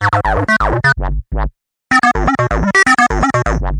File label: acid whup whup melody; SYNTH AND ELECTRONIC LOOPS